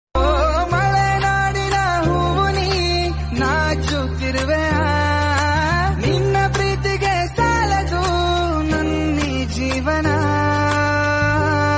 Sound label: melody song Kannada